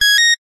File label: technology electronic device beep tone; zapsplat